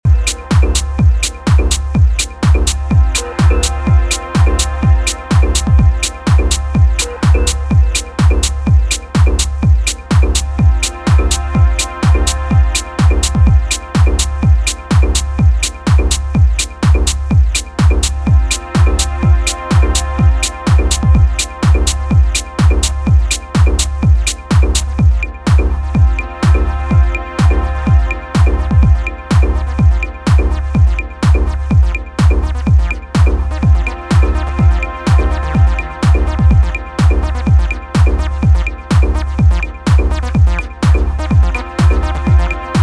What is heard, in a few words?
Acid Space, acid